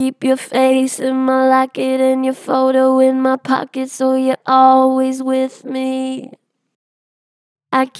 Str Flat Keep your face, Vocals, Pitch Flat Strong